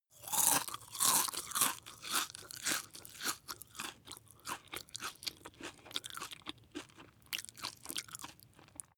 chew biscuit smack

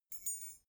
key ring small fly tinkle

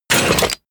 robot transformation metal short

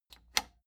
plastic button latch squeak click disable slow

